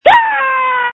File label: Scream